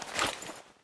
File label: draw machete; video games; player; taunt; source engine; tf